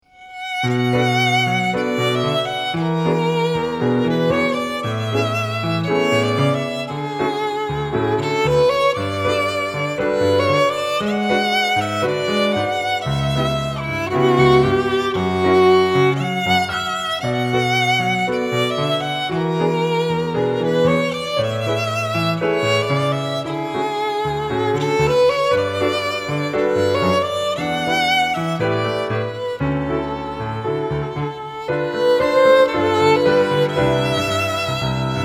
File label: Violin